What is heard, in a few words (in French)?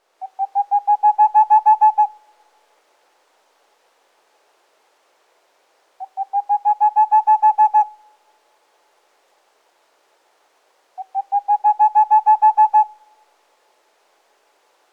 chouette de tengmalm